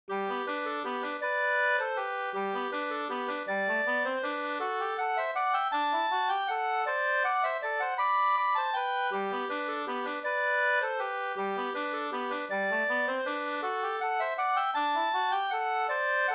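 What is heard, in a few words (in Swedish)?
vals Brudvals, two-part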